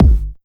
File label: Kicks; Vengeance Samples